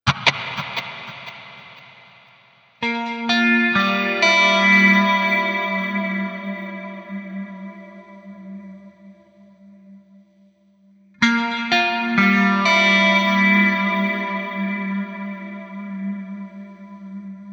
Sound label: GP 5 Clean